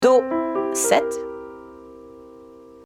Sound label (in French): C7 accord fondamental